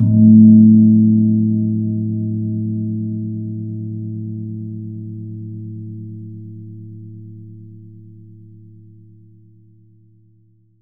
GONG A2; USB Soundscan vol.60; Asian Traditions; AKAI

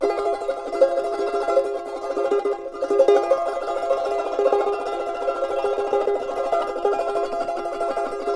CHAR D MJ TR, charango; Spectrasonics; Hans Zimmer Guitars VOL-2; CHARANGO CHD

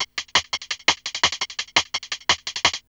hip hop; USB Soundscan vol.34; Burning Grunge; AKAI; TIMBA

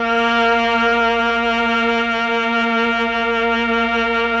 MELLOTRN A#1; Sonic Reality; Vintage Timetraveler

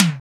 TOM, Eurotech; Sounds Good

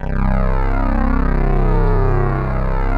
FLANGE C2; Greytsounds - Scott Peers Sound Engineering

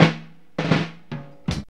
FILL 84, Funky Elements LOOPS; Zero-G

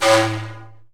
SIKU A1, The Andes

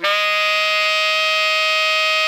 ALTO GR D#4, Saxophones; Greytsounds; Philip Wolfe David Crozier's Saxophones

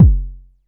KICK, DRUMS; Music Store Sound Service; Einstein

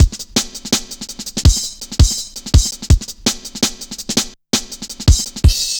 Virtual, Drum Loops, 165.5, Jungle

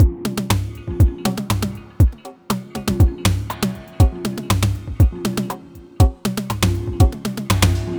Terrestrial Hybrid, Processed Percussion; Sonic Foundry (Sony Creative Software); Rhythmicronics

